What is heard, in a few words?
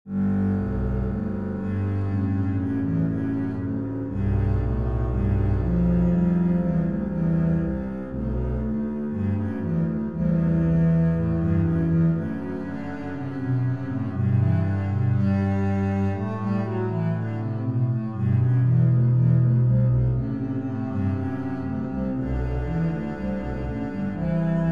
bass G major scale duet